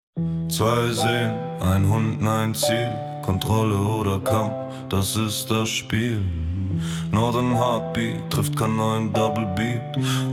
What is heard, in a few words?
Aggression Heartbeat vs Double Beat; Northern Battle